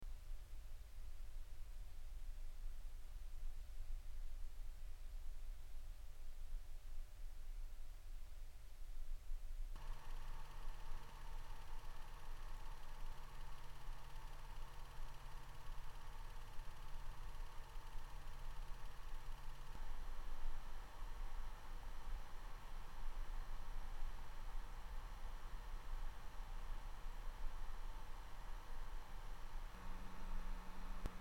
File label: antec isk100 5v 6v 7v 7.6v